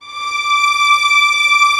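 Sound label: Vio legato D5; Digital Sound Factory Virtuoso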